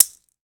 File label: mexican, Salsa Kit; E-MU Producer Series Vol. 7 – Old World Instruments